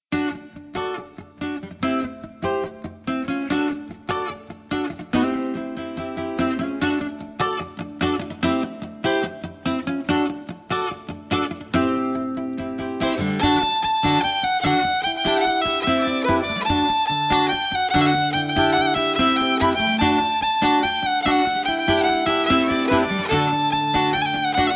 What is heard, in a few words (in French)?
avant deux de travers